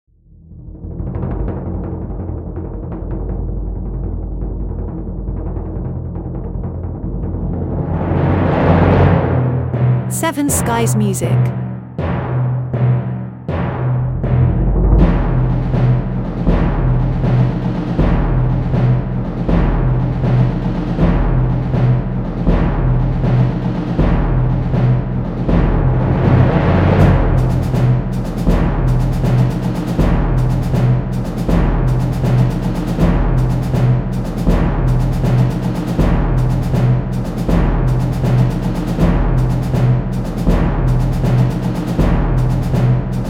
Military Drums

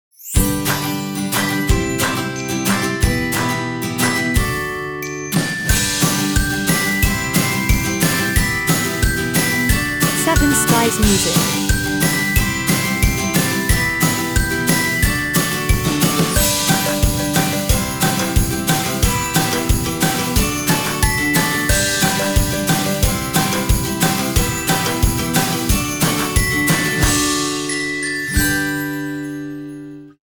Cheerful Promo

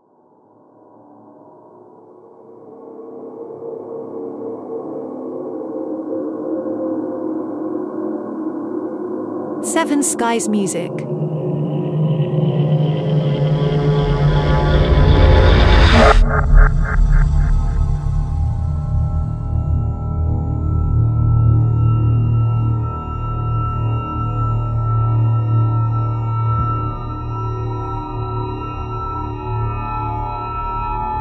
Dark Ambience

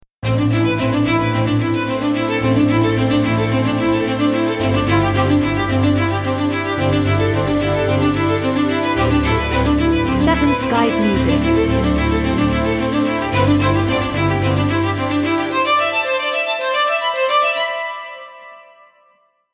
Staccato Strings Theme

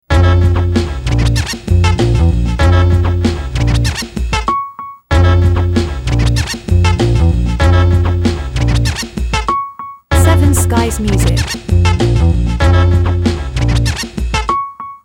Fun time loop

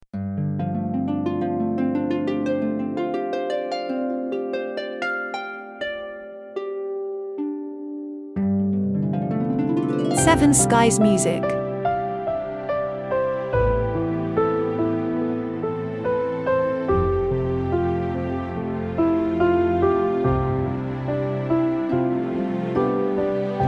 Romantic Movie Theme

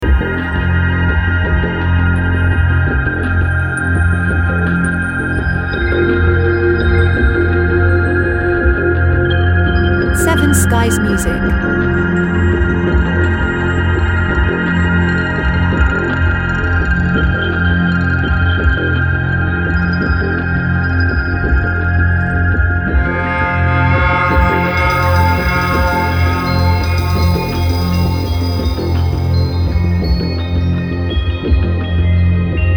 Ambient Suspense Deep Contemplation

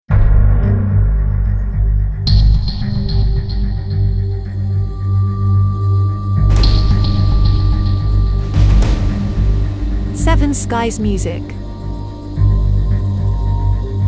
Ambient Suspense Solitary

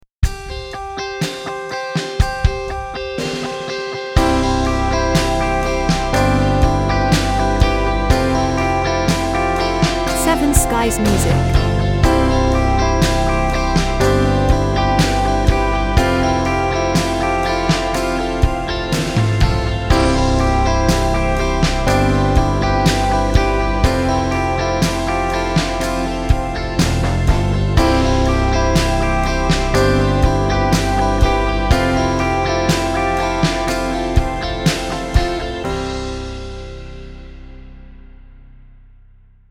jingle underscore

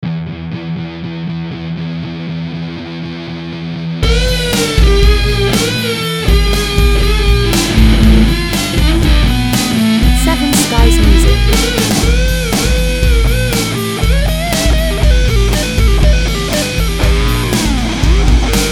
HardRock electric guitare small hard rock jingle

